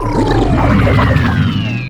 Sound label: Scifi Alien Energy Grunt; Energy & Forcefields